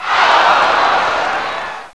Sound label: Shoop Close Call; Intel; Createshare; PC Camera Games